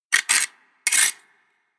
Sharpening Blade, knife; Knife Sound Effects; Fantasy Sound Effect; Andrew Fleming

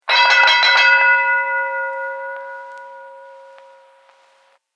Boxing Fight Bell Vintage; Boxing Sound Effects; Boxing (Sound Effects:Sports)

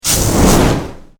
Science Fiction Fire Create Throw Super Power; Battle

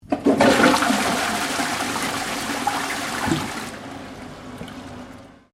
Toilet Commercial Flush; Australia; Toilets; Bathroom; Household

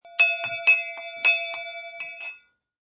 Bell Ring Multi

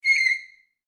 Footsteps Squeak Shoes On Floor; Human

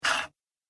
Robot Single Breath, sci-fi robot sound effect; Robot Sound Effects

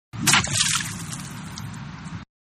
Golf-Drive-Hitting Ball From Water; Sports; Manhattan Production Music

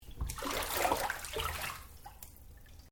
Water Bath Spa Movement

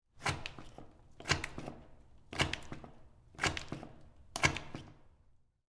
Soap Dispenser, Public, Bathroom; Office; Public Bathrooms

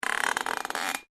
METAL CREAK; Materials

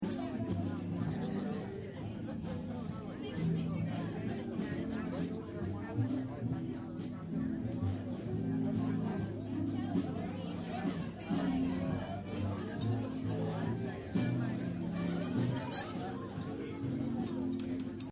Nightclub Music Xmas Loop; Bars And Clubs; Sound Effects:Background; Partners In Rhyme

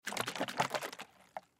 Spray Bottle Shake; Spraying; Sound Effects:Objects

